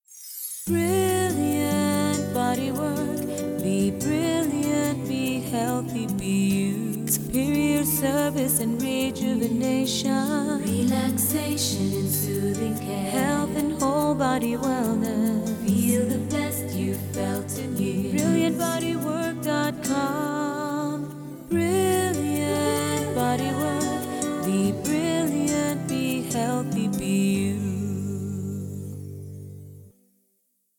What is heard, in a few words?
Full Sing, spa jingles; Brilliant Bodywork